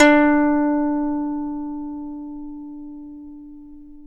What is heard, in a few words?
D3 DULCIMER; AKAI S6000 CD-ROM; WorldSounds